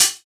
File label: hat